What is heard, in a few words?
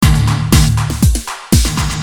depressing rock music